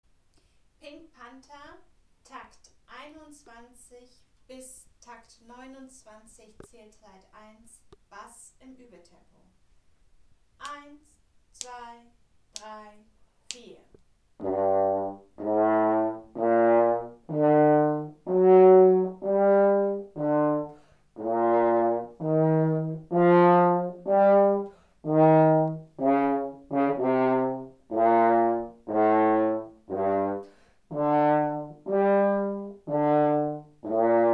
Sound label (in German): Stimme 4 Uebetempo, Posaunenchor; Takt 21 bis 29